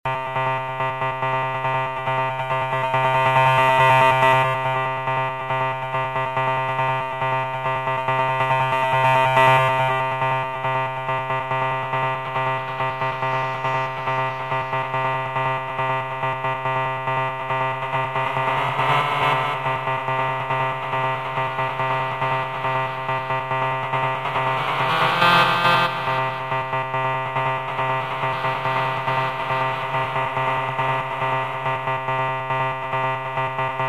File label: Semi Rhythmic Flow